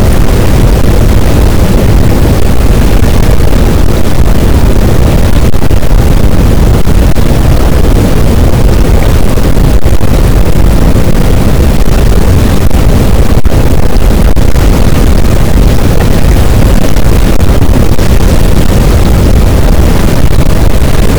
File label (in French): cachalot, boat; Physeter Macrocephalus